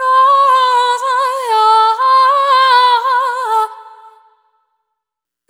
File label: Compulsion, Vocal Samples, Loops; Sonic Foundry (Sony Creative Software) - Pocket Diva World Class Vocal Samples; Op-Erratic Loops